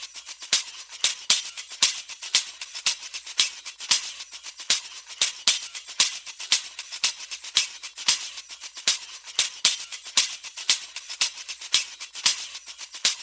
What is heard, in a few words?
Antique Tambourine 115 BPM; бубен